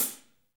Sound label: HH, Kit s; 90 s Sample CDs; Sound & Vision - Gigapack I CD 1 (Roland); Real-Kit s 2